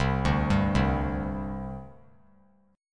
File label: C Suspended 2nd, C, down, chord; Csus2 arpeggiated